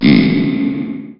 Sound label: success, Hammer